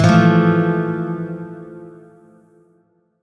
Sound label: Shawzin Maj Chord F; Music; Warframe; Lotus Shawzin